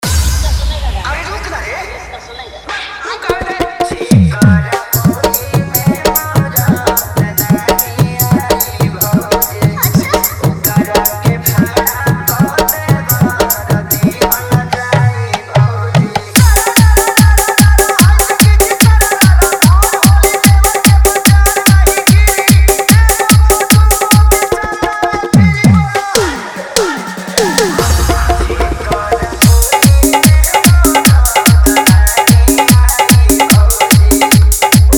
Bhojpuri Holi Dj Song Hard Dhollki Bass Mix

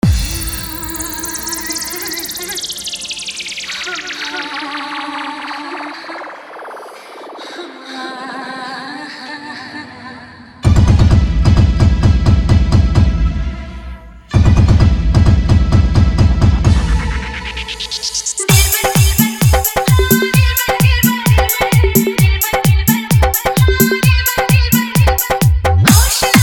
hindi hard dhollki mix

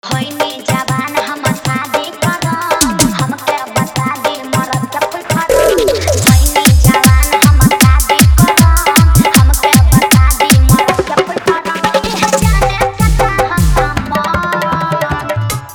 Hard Dhollki Bass Remix

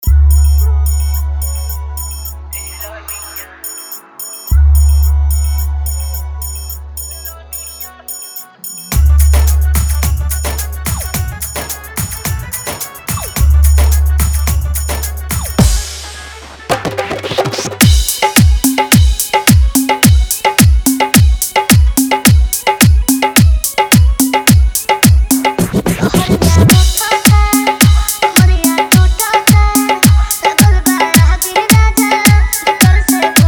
Bhojpuri Song Hard Dhollki Bass Mix

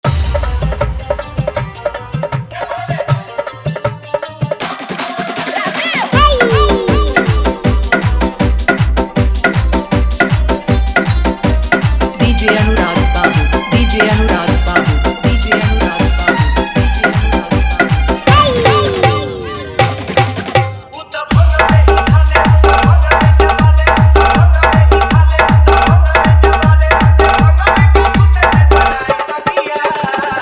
Dancing Bass Dance Mix

